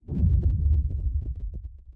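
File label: movement low whoosh; smite; god atlas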